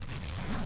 ZIPPER Short; FABRIC & CLOTHING; mono; Universal Sound FX

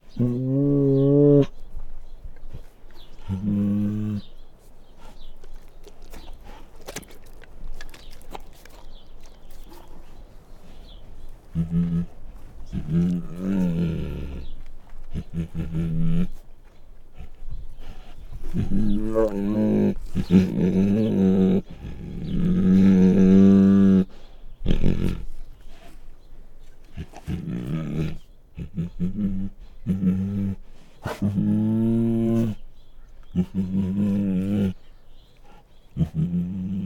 Female Bengal Tiger Growls and Snarls; Sound Ideas; Tigers Sound Effects

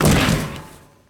Bodyfall Hollow Metal; SFX Kit; Bodyfalls

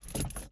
driver foley wheel turn; Blind Drive

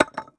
IMPACT Concrete Slab on Concrete Slab; mono; IMPACTS; Universal Sound FX

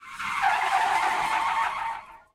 skid asphalt; Blind Drive

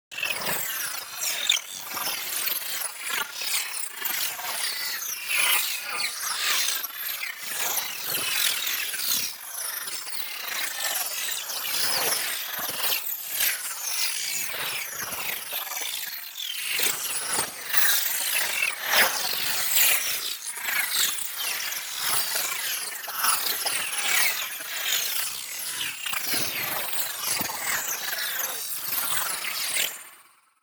NEON PARTICLES SMALL FAST; Plasma Fragments; boom library

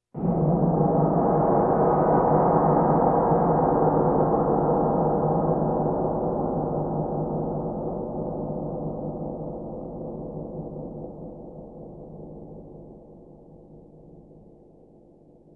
Percussion Bongos Tympani rolls Gongs Drums

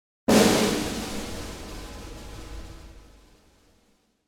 Snare Flam Orchestra With Room - Attention; Hollywood Edge; sound designer's toolkit v2

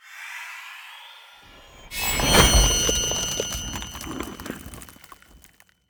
Ice Spell Whoosh Frozen Pierce, Cold Whooshes; Arctic Aura

